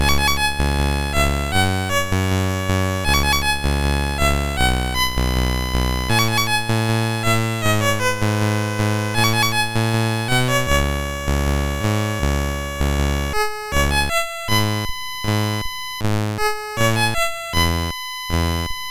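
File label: Roboservant, MOS 6581 SID chip music, games; hvsc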